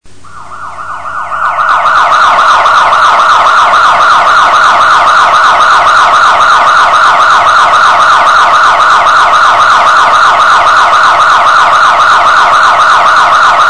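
sirena skoroi ili miliccii, ambulance or police siren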